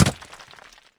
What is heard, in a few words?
small rock impact